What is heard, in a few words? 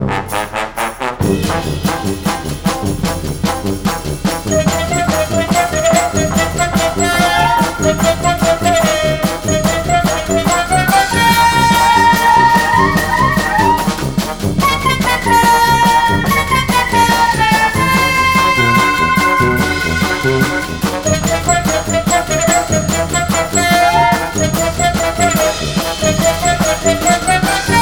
bump in the night, music; Garry's Mod; tf2